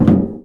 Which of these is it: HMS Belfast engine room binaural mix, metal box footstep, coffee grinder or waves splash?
metal box footstep